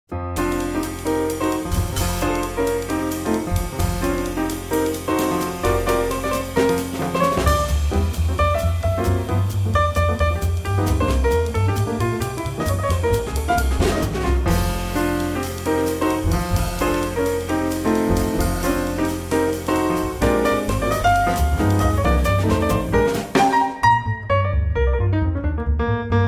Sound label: rhythm